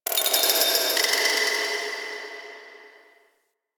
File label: Moon Clockwork Texture Small, Ambience; Warframe; Orokin